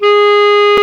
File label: Clarinet; CMI